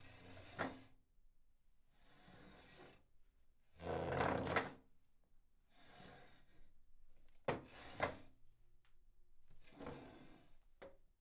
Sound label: Pushing Chair